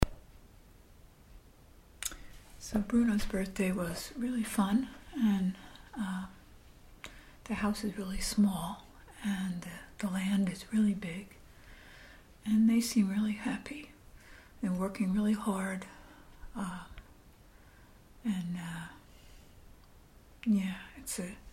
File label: yay